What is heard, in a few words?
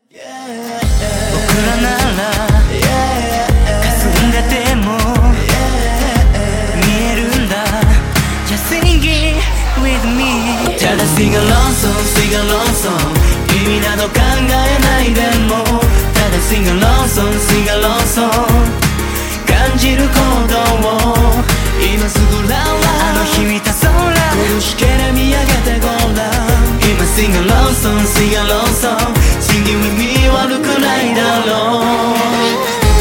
Sing along song